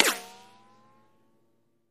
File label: Bullet Ricochet Sin